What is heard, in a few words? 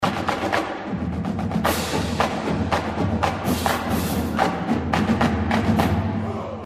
cadence